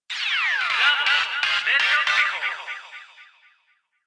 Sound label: Radio Jingle